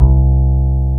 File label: BASS C1; AKAI S-Series CD-ROM Sound Library VOL-8